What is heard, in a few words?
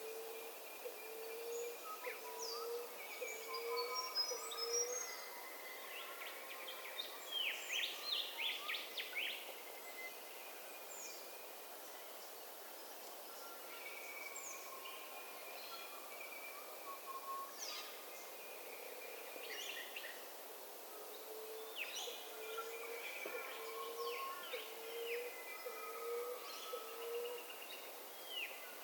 soundscape; hillview